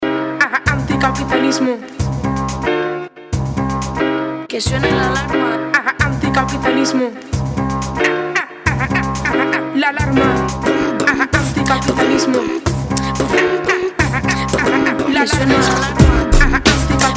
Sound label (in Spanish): alarma; la plataforma